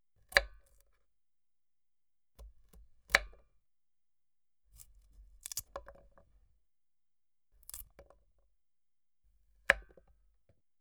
Finger Cutting; 1001 Sound Effects; Halloween Horror Sounds Effects

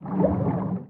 skid sea side; Blind Drive

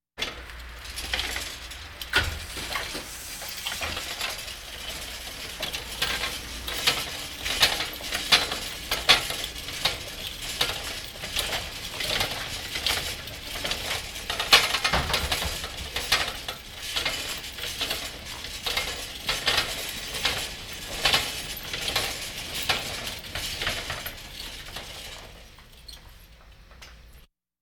Hospital effects

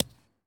Baseball Bounce Dirt

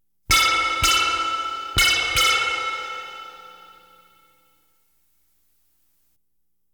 Science Fiction effects, Lasers, Light beams; Valentino Production Sound Effects Library CD50

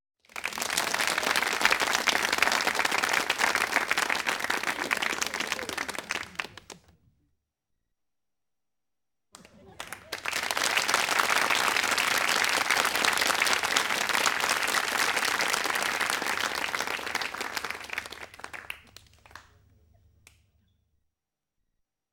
Applause studio audience; Interiors With People

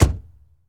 Truck Explorer Door Close; Trucks

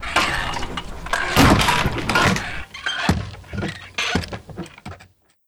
pub door; DeadFright

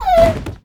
blast death; Apotheorasis

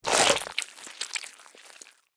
zombie bite; DeadFright

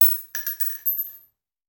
Bullet Shell; Sound Ideas; Guns

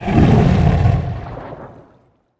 Water Enemy Growl; AudioWizards

